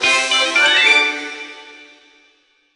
Jingle Bonus Key; Shrek Forever After

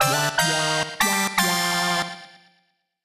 SPACEDISCO STING; Lego DC Super Villains 2018 Nintendo Switch

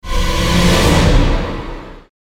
Environment Death; ratchet clank into the nexus

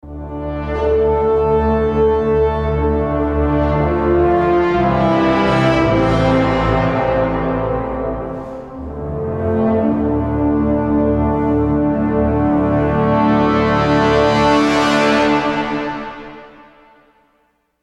Scout Fade Stinger; Global War Room

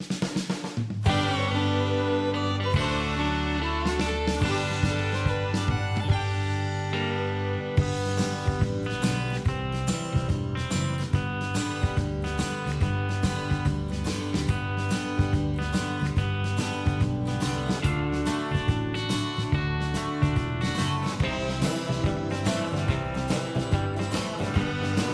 Karaoke Country; The Sims 4 City Living; macOS; PS4; Windows; Xbox One; Xbox Series X/S

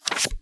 Card Flip; Garfield's Diner; Android game music

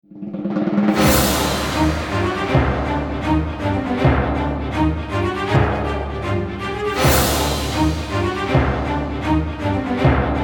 Town Medium; Serious Sam 2; Ellenier

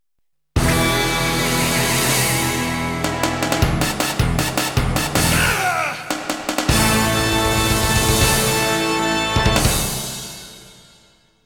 Stage Clear (Long), PC Engine CD; Cho Aniki

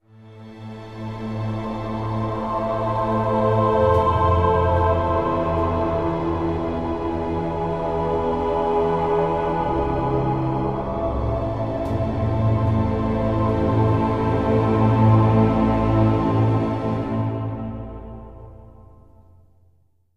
SHORT Music; Unreal II The Awakening

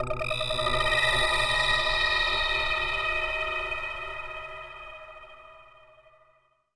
Flourish, soundtracks; bolt 2008 pc gamerip

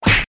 Sfx Punch; Pizza Tower early build test ost

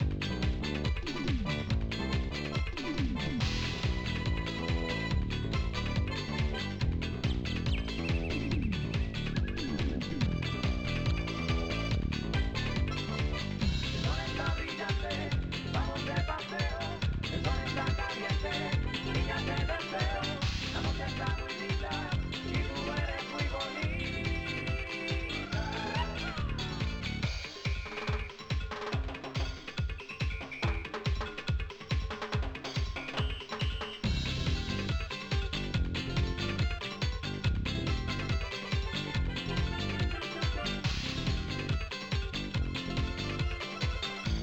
Caribbean; Cruis'n Exotica

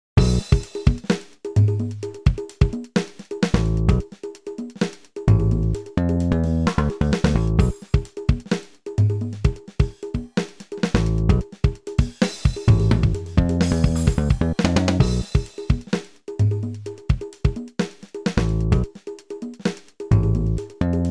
break beat; mission 5-2

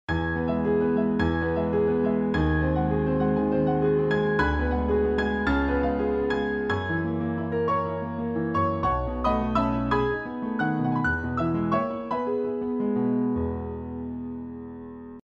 Piano Royalgrand; Piano app by Yokee

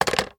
Phone Hang Up

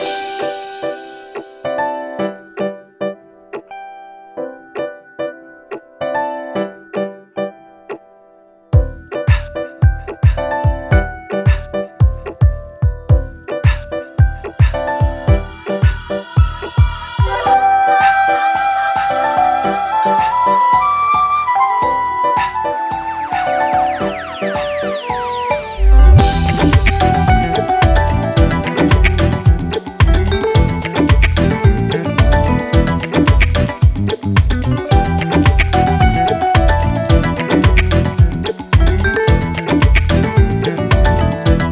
Funk Menu 110bpm; PS Vita; Funk of Titans

